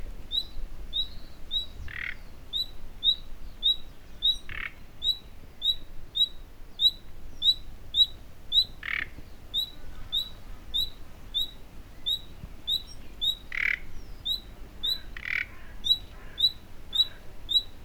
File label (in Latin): Luscinia megarhynchos; SETE